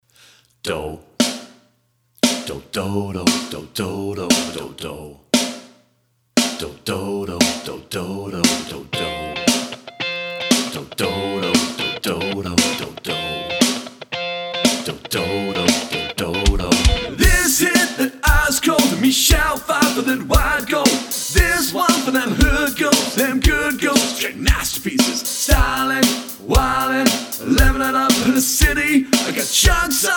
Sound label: Rock Pop Band; West Mids